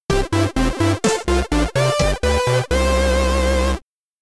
Stage Clear!, SID Stereo, commodore 64; Eskimo Games

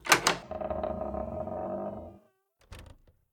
Door squeak creak slow

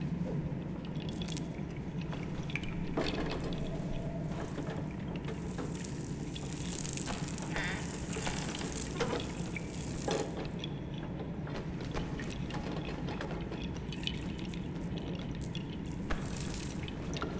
Ambience streets; adams venture origins

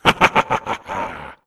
laugh

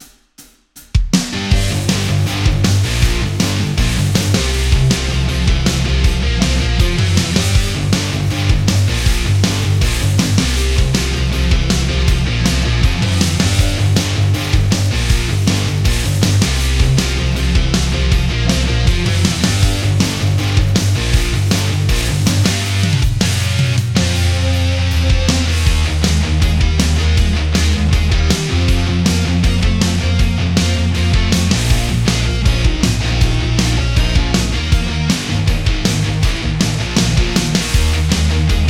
Music Rock; Jetpack Joyride; Android; PS Vita; PS3; PS4; PSP

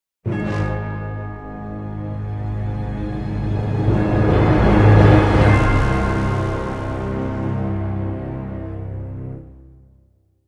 Defeat

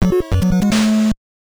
Roulette Bet Done; Casino Kid 2; NES

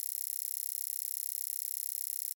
Dial Count Loop

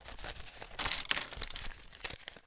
Spend Money

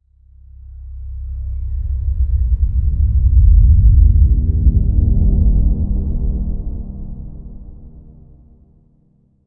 Eerie Stinger

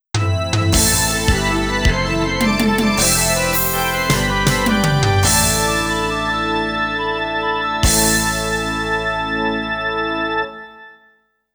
Last Stage Clear - Midi Roland SC-55 Version

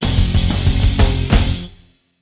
Sting Get Collectable; X-Men Origins Wolverine; NDS

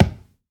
Tree trunk Collision; angry birds rio

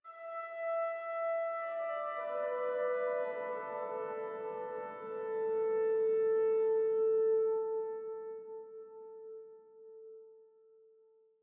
Organ High

